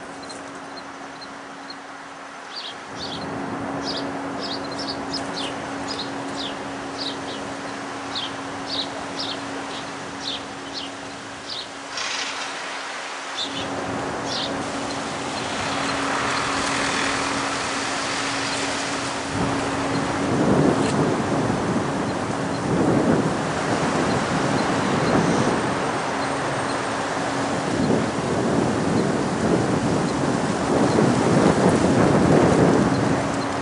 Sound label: Red-eyed Vireo in tree